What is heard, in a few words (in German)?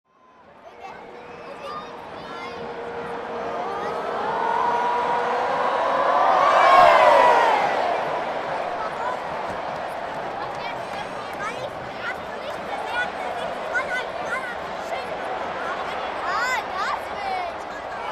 Atmo Stadio Welle und Torschrei; 2016; Sternsinger-Cup